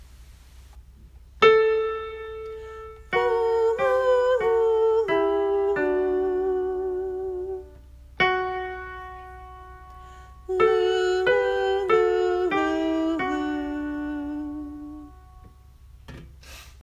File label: harmonic progression